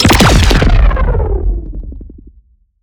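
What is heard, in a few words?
Shot Large Blaster Distorted Cruncher Boom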